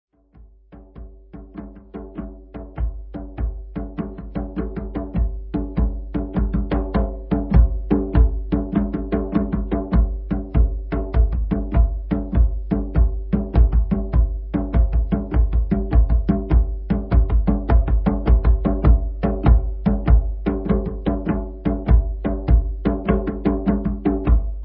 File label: HEARTBEAT PULSE, Drums